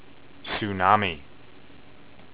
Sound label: tsunami